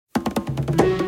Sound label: Jungle